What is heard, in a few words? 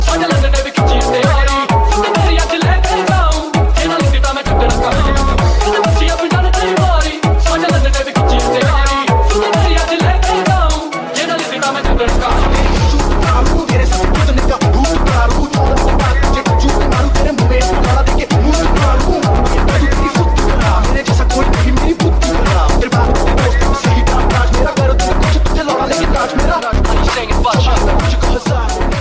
Bass Mix